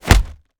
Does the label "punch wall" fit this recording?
Yes